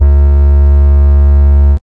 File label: TRAX BASS, Hard & Loud Techno; USB Soundscan vol.01; AKAI